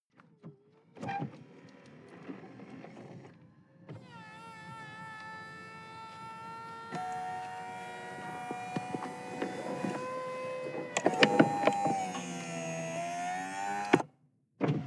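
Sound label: sports car interior convertible top close; verkehr-transport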